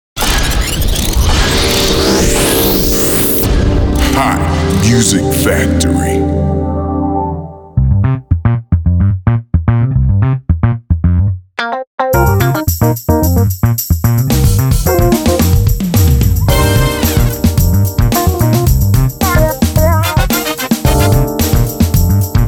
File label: super funk machine